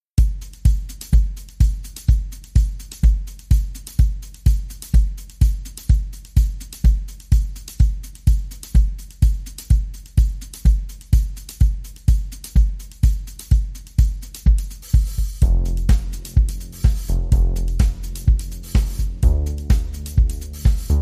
Drum and Bass

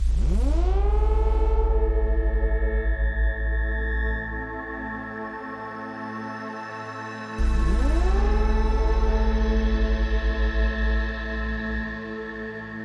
Pad

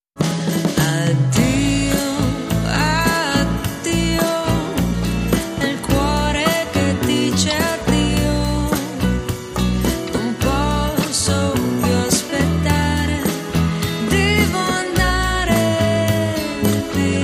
Female Vocal

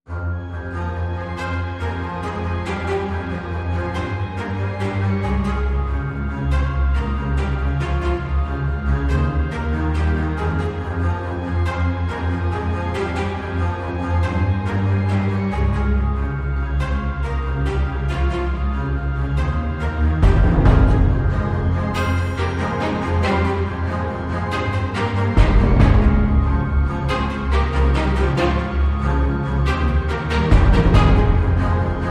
Orchestral

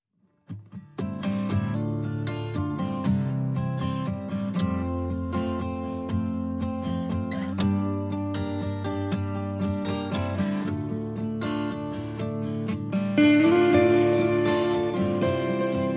Guitar

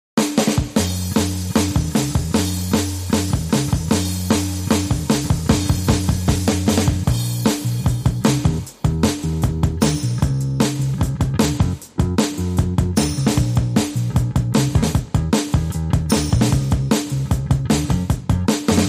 Drums and Bass

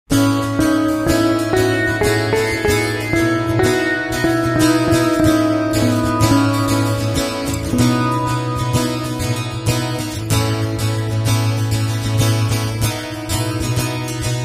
Acoustic Dream

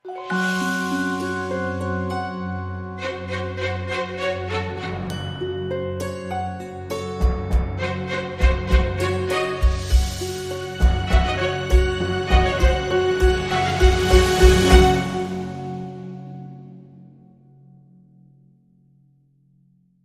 Dramedy Sting

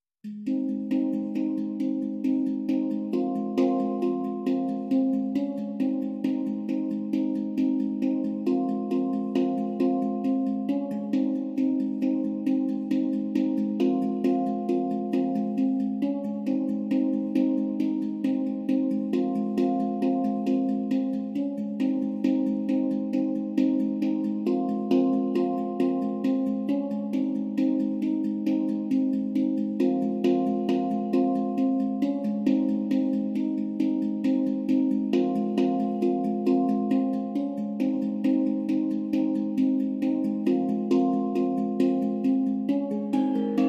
Hang Drum Only Mix

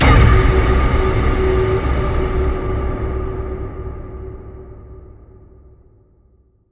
Hit Big Solid Rock

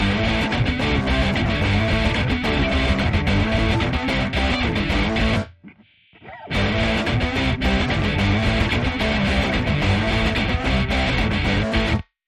Breaker Action D Guitar Only

